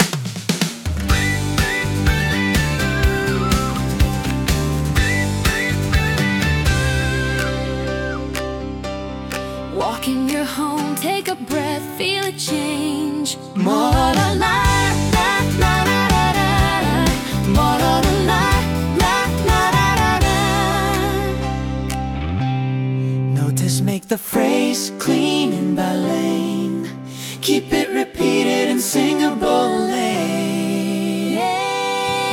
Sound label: JINGLE